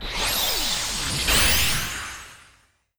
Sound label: Gacha Card Get Normal